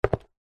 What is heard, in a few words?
foley footstep single soccer boot on tiled floor